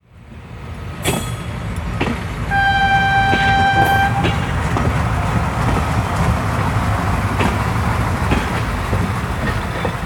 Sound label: train depart with whistle